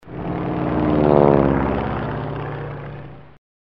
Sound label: Sound effect airplane